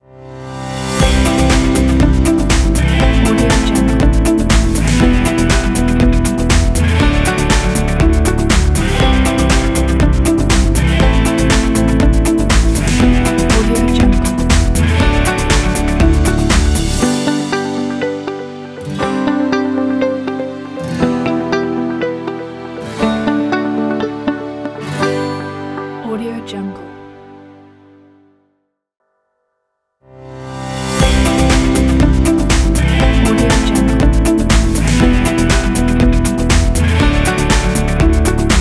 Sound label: corporate action logo